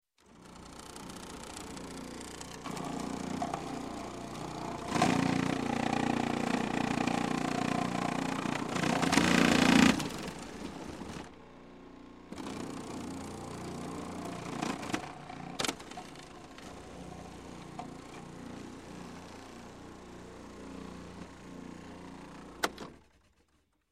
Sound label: Food Processor Slicing Vegetables; Sound Ideas 2000 Series